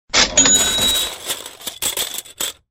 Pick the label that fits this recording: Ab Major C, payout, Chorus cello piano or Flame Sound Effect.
payout